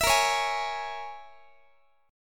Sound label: Bb Minor 7th Flat 5th, Guitar; Bbm7b5 strummed